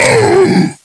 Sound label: Clot Pain, Elf